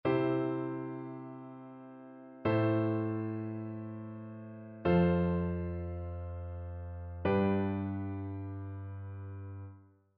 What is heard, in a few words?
harmonic dictation; aural skills i